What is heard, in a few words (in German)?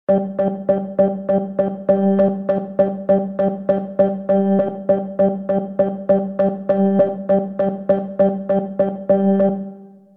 artikulatorischer akzent; Songwriting Grundlagen